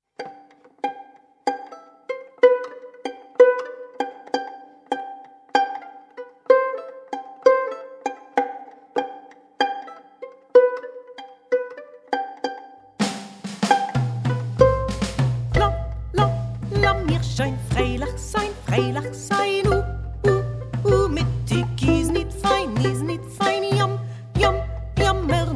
alpen klezmer

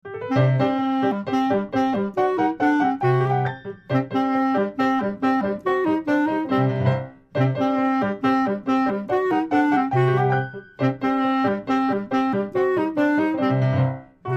nigun du feu

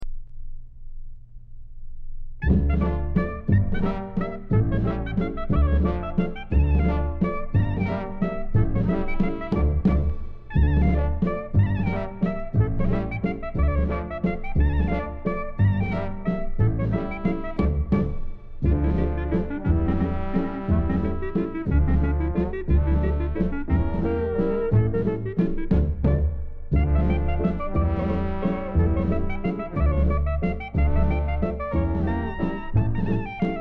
polka mazurka

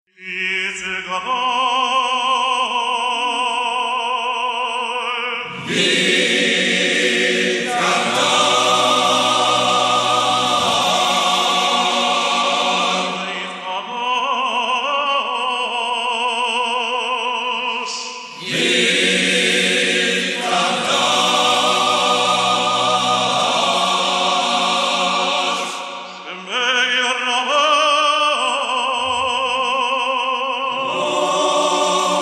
chatzi kaddish

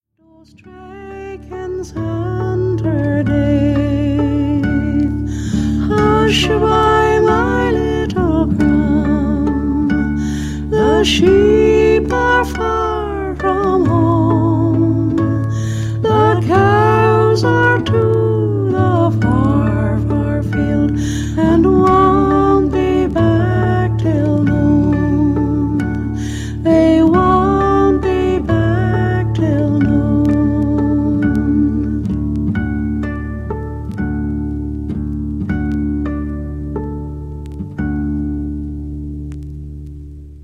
cradle song; suo gan

